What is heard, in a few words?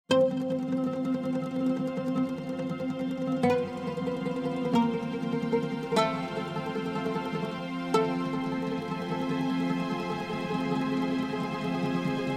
Menu music